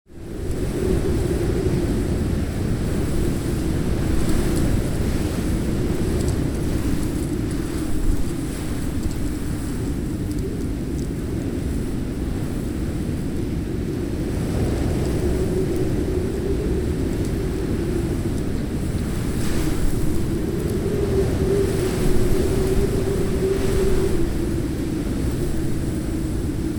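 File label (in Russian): холодный зимний ветер в ветках; Звук ветра в лесу; звук ветра в листве; ветер в лесу зимой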